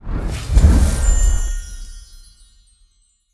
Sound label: medium Win; netent